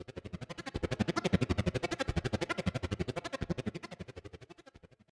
Monster Mysterious

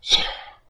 Through

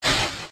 Air Slash

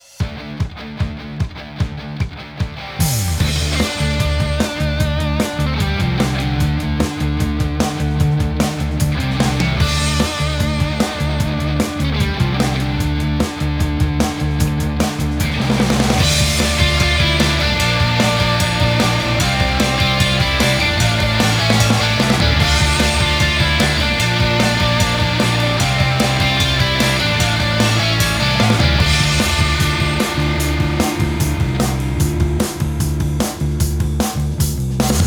Rock Out No Radio; RISE: Race to the Future

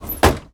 Close machine; Zenonzard

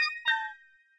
Happy

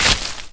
Hit Generic Foliage

